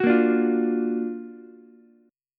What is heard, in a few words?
F sharp Augmented Major 7th F sharp Ukulele; strummed; JGuitar